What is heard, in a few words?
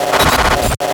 Glitch Static